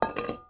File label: drop metal hollow; Rust